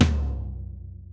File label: Drum Tom